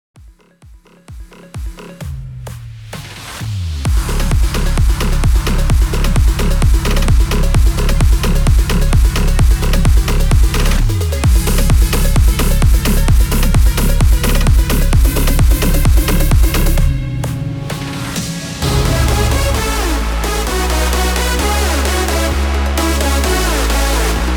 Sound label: BIG ROOM 130 BPM